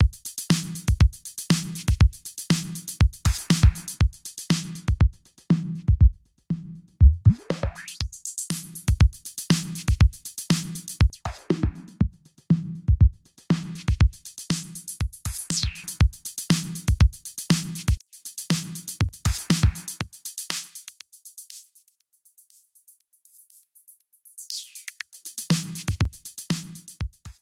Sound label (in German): Filterbewegungen